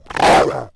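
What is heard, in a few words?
Wolf Attack Vox; Civ4 warlords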